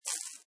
Money, Button sound; Multimedia

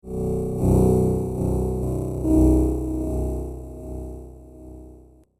Deep Resonant Laser Bounce

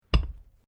Weights Dumbell Put Down; Gym weight lifting equipment; Sports

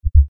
Lfe Swell Low Bass Movement; Swells; Blastwave; Sound Effects:Sound Design

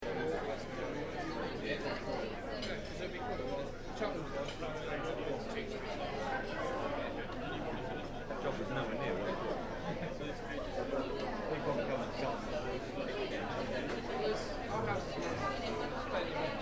Bar, Interior: Ambience, Talking; Bars and Clubs; Backgrounds; Bars And Club Sound Effects; Bars and Clubs (Sound Effects:Backgrounds); Stuart Duffield

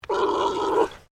Animal Alpaca Male Mating Call; Alpacas on a farm in Gippsland Victoria; Miscellaneous Animal

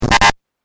Retro Glitch; Glitch Sound Effects; sound design

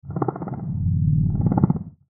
Monster Breathe Purr Horror Creature; Animal; Monsters; Blastwave

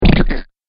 Big Muddy Splat, slimy

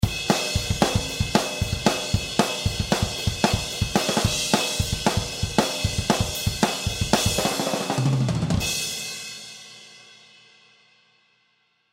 Drum Beat Rock Up, Drum Beat Rock Up Tempo; Drum Kit; Blastwave

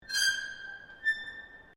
METAL IMPACT SCREECH; Materials

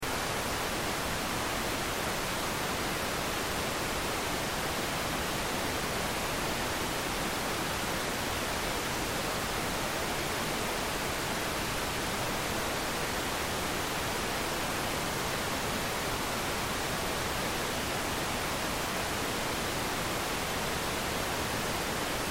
Static Television Filtered Noise Loop; Televisions

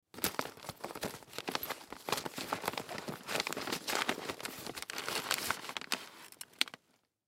Briefcase Bag Rummage Through Papers Foley; Miscellaneous Office; Blastwave

